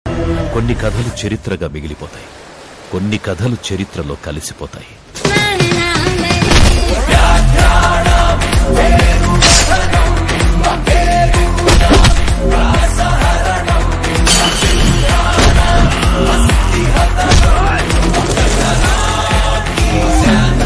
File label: trailer bgm ringtone telugu; Hidimbha; Ashwin Babu